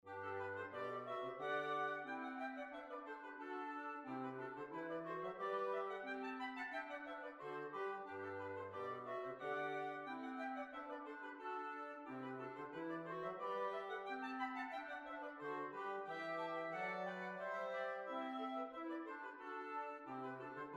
Hornpipe; Tumblers Hornpipe

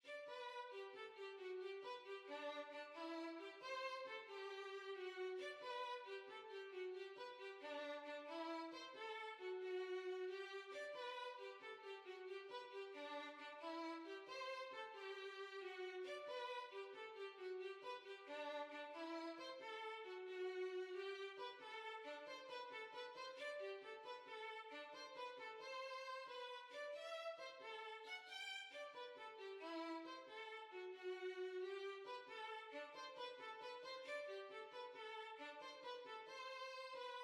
Violin

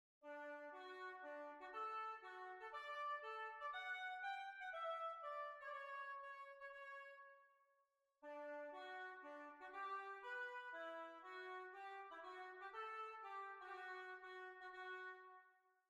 March

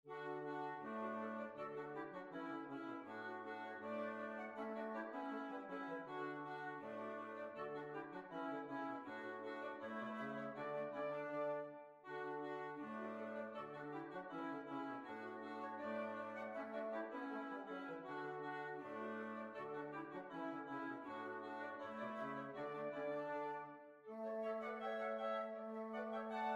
Jig

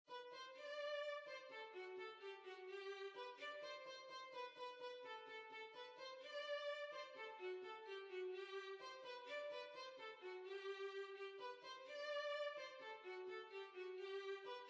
Violin

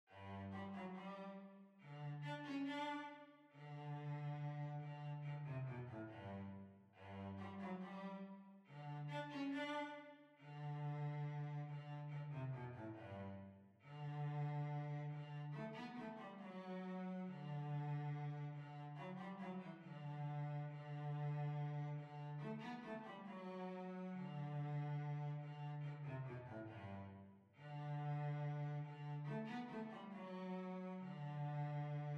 Hornpipe, Violoncello

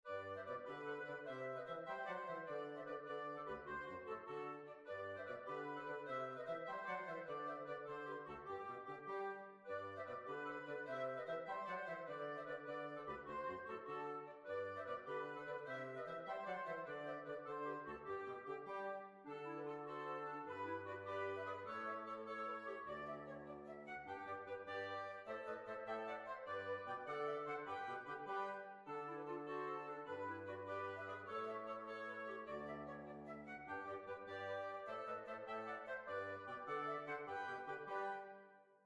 Kitty Kick away

